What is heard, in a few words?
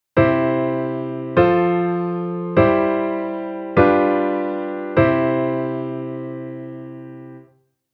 Chord Inversion C F C G C